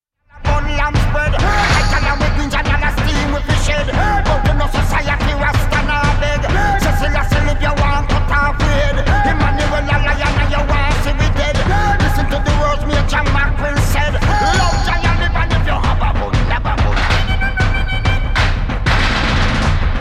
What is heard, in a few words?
congo beat the drum